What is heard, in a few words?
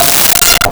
Marimba F5; Nintendo; July 2020 Gigaleak